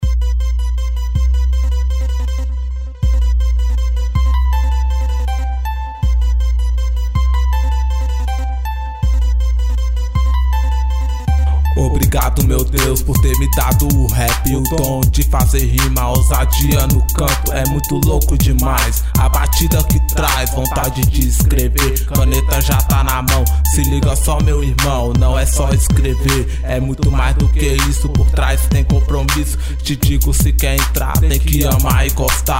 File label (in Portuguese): rap; verbo cristão